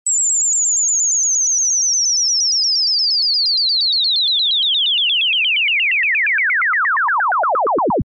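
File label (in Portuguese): REVERSO Estímulo 50Hz-8000Hz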